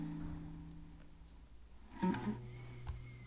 LOOP Ukulele Stereo

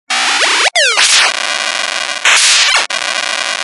8bit; RingTones

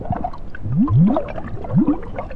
underwater

